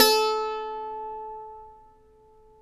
SAZ A 4; Spectrasonics; Hans Zimmer Guitars VOL-2

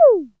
EH32 SPACE, Electro-Harmonix DRM-32

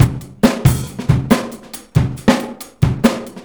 ACOUSTIC, Big Beat Drumloops

